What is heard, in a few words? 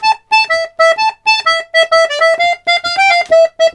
TARANT, Complete Accordions; USB Soundscan vol.40; AKAI